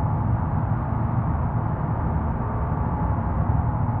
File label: sparse soundscape samples; ReLooped